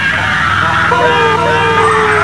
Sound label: Error